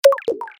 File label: start